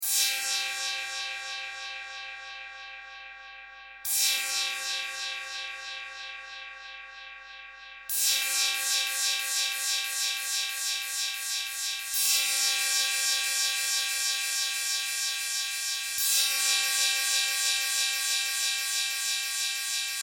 lex 808 cymbal; shootout

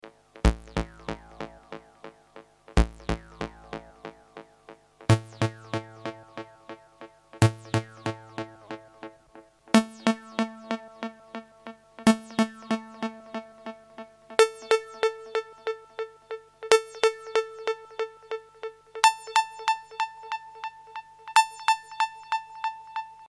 roland hi; shootout

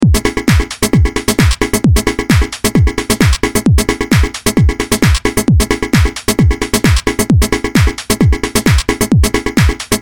drumloop dry

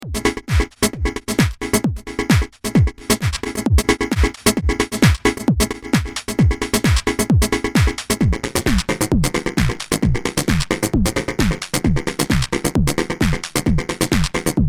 drumloop jam

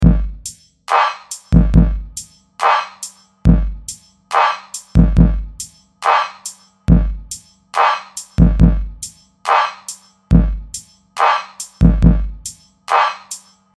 phonon blast; ir samples

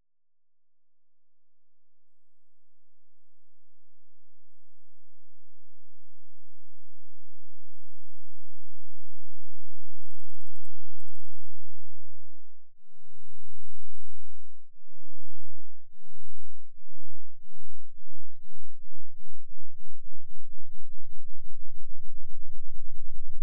big sine sweep

